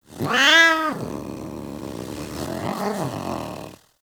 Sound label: Feral Vocal Idle E; Warframe; Catbrow